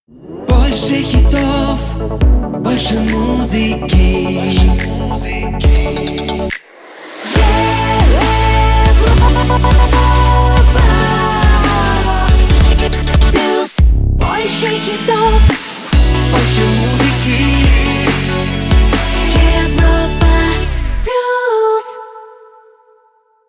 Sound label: Jingle; Europa Plus